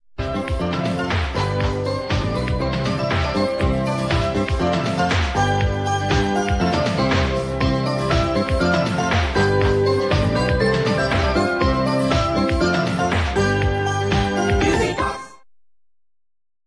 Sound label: Musicbox